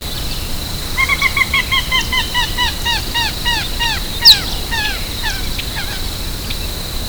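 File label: wipeout, Common Moorhen; National Marsh Bird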